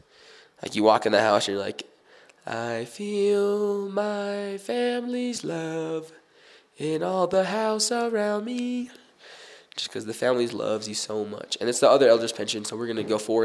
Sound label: happy song; Pension